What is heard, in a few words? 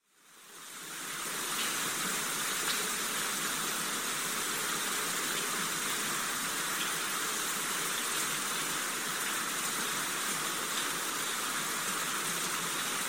Forest Rain Showers